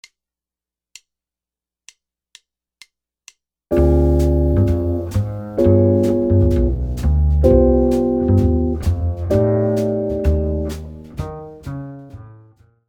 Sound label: jazz guitar comping